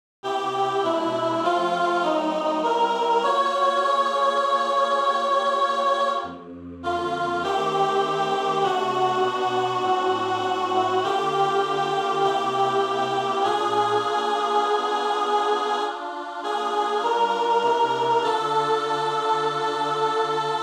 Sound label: Tenor, Barbershop